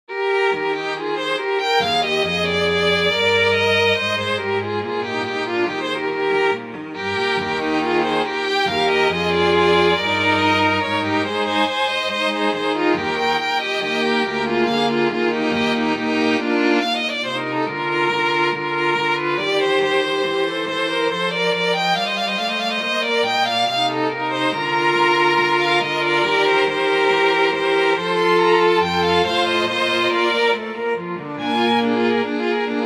strunnyi kvartet (vse golosa); i.k.f.bah

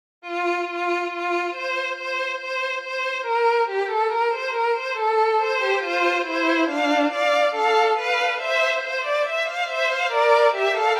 sonata v fa majore (vse golosa); iogann pahelbel

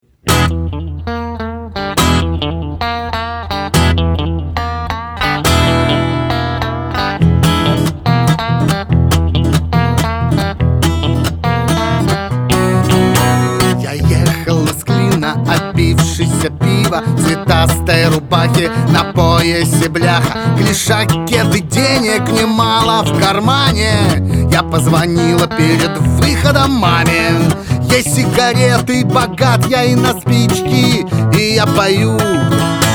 bluz

